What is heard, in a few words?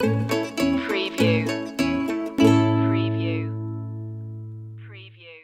jingle bells ukulele stinger